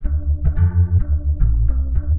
110 D, Warped Bass; Loops; Fatty Boy; Touch Loops; CosmicFunk

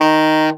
A SAX POR D#2, Alto Sax Portato; Orchestral; VarazdinOrchestral

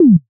Glitch, One Shots, Glitch FX; Touch Loops; CosmicFunk

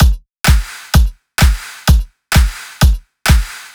drum loop 128 Strp; Anthemic

